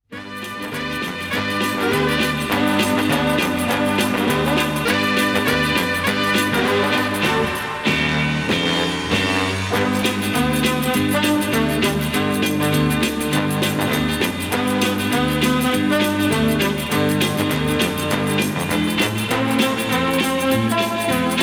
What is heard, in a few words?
Big Band